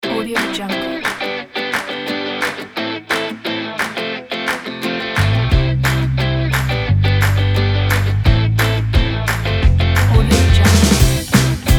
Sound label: happy upbeat; bluewhalemusic